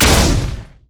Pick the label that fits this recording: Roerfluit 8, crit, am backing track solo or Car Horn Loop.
crit